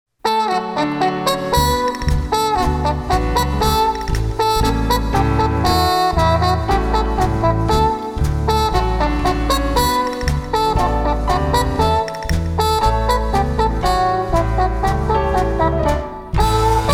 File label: Latin Dance